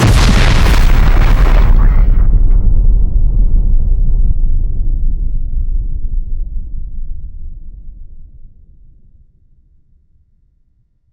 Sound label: Nuclear explosion; Hovl Studio; SFX Battle sounds; WhiteMan Unity2D